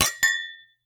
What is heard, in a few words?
wine glass double chink clink cheers